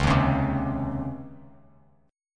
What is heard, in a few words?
Db Major 7th Suspended 2nd Db Bass Standard; 9,6,11,5; DbM7sus2 strummed; JGuitar